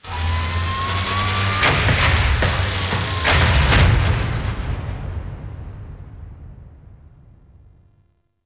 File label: Ship Extraction Docking; Vehicles; Warframe; Liset; Liset Flight; Grineer Ship Liset